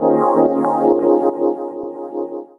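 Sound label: Pad Synth; Equipped Music; Loungin House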